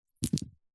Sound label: money coins 3x 2 dollar australian drop on carpet; foley; zapsplat